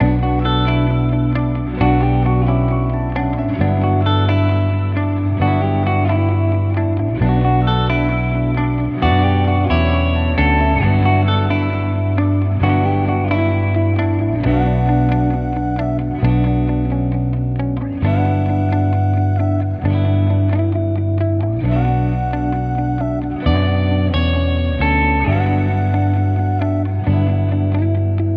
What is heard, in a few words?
133 BPM B Min, Guitar; Cymatics; Destiny Premium Melodies (BETA); Bonus Pack; Don't Love Me Back